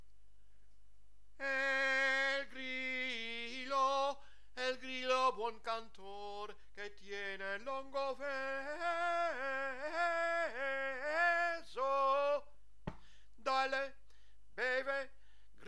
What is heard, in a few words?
el grillo tenor